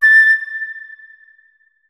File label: flute notes; Hideout Furniture